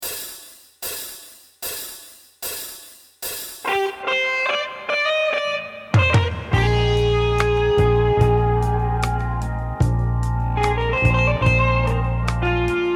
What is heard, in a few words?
Drum Recital